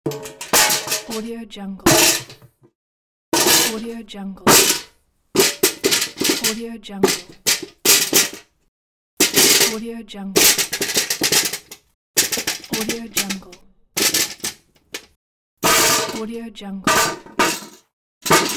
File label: pour into an iron bucket; kaelv